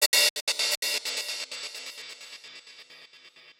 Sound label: Gated Cymbal; musicradar